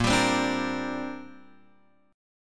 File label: Bb 6th Add 9th Bb Guitar Open F; Bb6add9 strummed